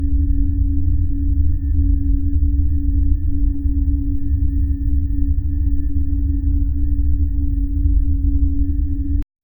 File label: soft stepper motor moveme, machinery